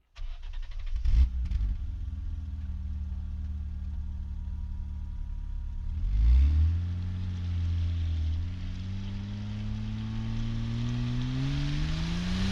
BMW 520i Exhaust Rear Bumper Start Accelerate Medium Everyday Drive; FLYSOUND